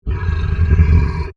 Monster Snarl, Horror, Monster, Snarl, Large, Creature; BlastWave